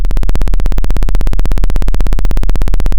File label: Sound of a plane taking off